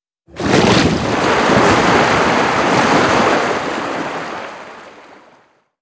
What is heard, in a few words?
zodiac reverse splash